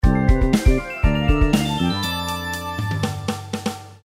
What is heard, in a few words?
Game Success; grammar games